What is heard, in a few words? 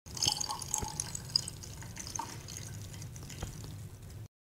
Pouring tea coffee; چای و قهوه; ریختن